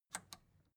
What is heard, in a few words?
record player power release on